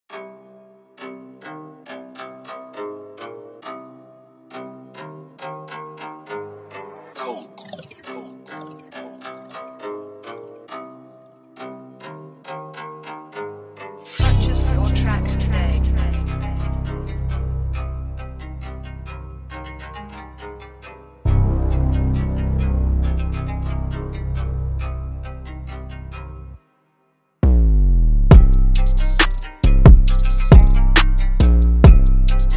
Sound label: Japanoise Beat